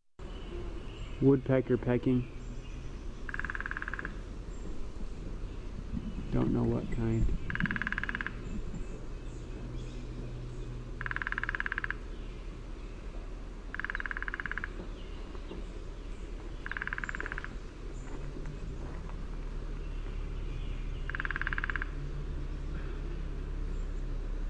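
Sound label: Downy Woodpecker drumming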